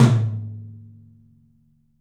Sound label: TOM, 3D Drums; 3D Sound